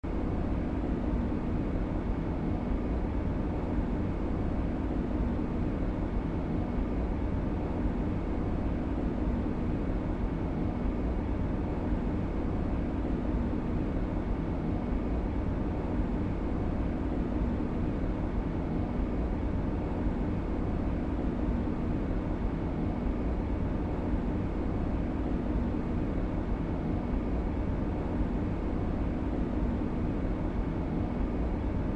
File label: Large Airy Ac Hum